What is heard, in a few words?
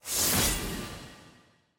lobby visor close